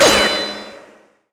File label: ricochet; Drunken Robot Pornography; Inago Rage